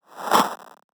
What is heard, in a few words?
8Bit Fx; Antidote Advent